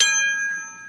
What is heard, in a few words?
ding, bells; Misc Percussion